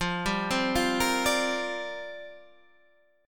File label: C 7th Suspended 2nd Suspended 4th F